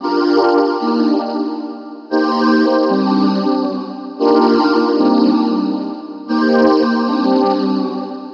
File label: 115 G Synth Water Park; Zero-G; Future Wave